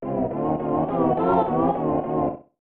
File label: Power Meter (Collapsing)